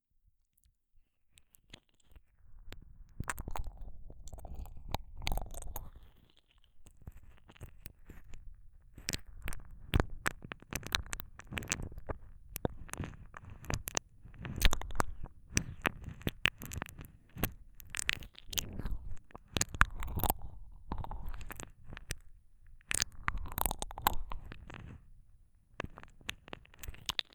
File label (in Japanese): ねっとり耳舐め, ASMR